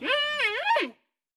熊猫担忧声

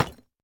深板岩砖放置声

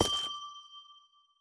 紫水晶踩踏声

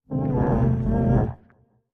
环境声; 监守者

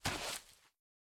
海龟行走声

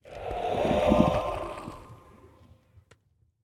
不祥试炼刷怪笼环境声; ambient

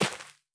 缠根泥土破坏声

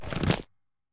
嘎枝解冻声

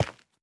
滴水石踩踏声

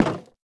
下界木栅栏门开关声

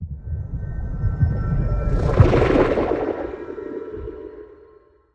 洞穴环境声; ambient

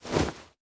收纳袋倒出内容物声

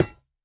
下界合金破坏声

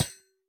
铜格栅 踩踏声

